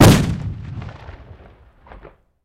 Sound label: Cannon Blast Solid, shots